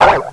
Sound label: pit drone pain; svencoop